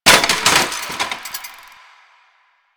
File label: Bicycle Hit; Ambience; Props; Warframe; NineteenNinetyNine